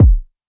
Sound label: kick solid